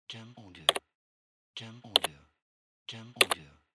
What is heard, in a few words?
PC Buttons